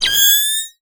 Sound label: night vision on; drones; garrysmod